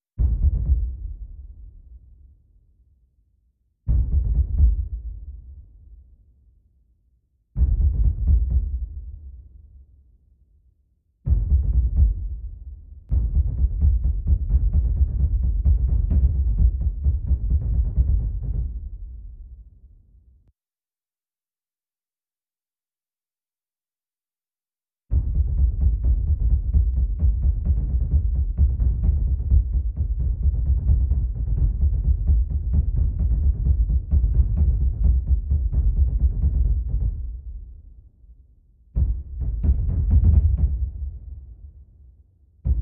Track Layer Bass Drum

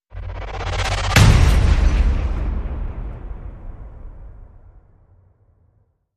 Hit Squiggle

